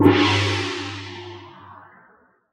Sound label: Mini-Game Finish; the legend of zelda skyward sword wii gamerip 2011